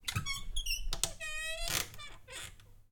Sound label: squeaky closet door open; 3maze; Doors, Locks and Drawers